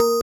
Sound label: BELL